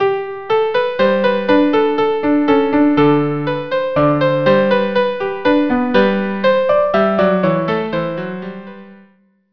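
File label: violin viola minuet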